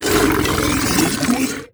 swarm generic idle; Stellaris; other entities

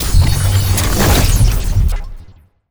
world machine show planet; ancient relics

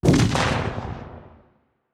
kaiju lightning hit lightning crack; Stellaris; biogenesis; weapons

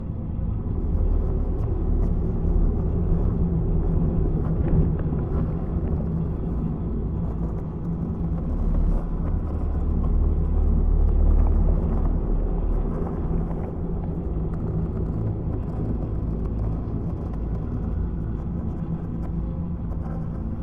ambient psi storm; System VFX

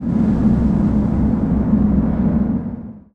gas giant; ambient; Stellaris

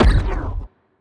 anti fire rate laser hit, weapons; biogenesis

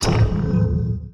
disruptor hit, weapons; Stellaris

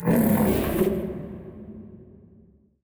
void worms nymph attack; Stellaris; grand archive; ships

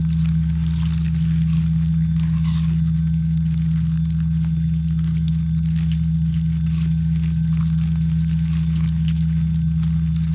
ant ship science idle; biogenesis

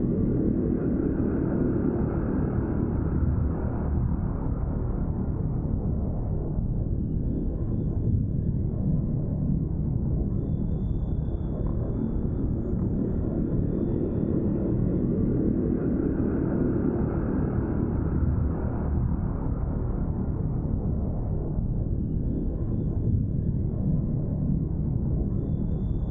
ship starbase idle; Stellaris; machineage

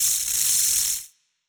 lightning muzzle electrical sparks, weapons; kaiju; Stellaris; biogenesis

